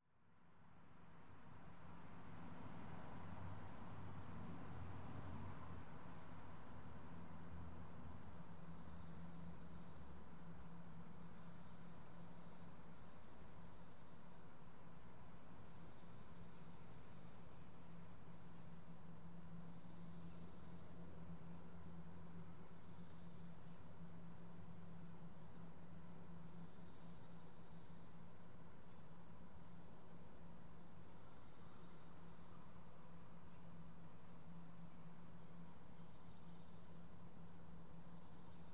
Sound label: outside ambience for sunday